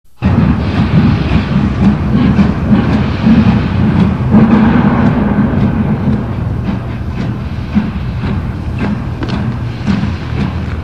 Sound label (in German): Anfahrt; alsdorf